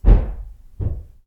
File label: hit wood floor